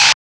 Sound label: SCREETCHER, PHUZZ DRUMS; Spectrasonic Distorted Reality 1